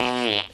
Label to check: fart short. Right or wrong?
Right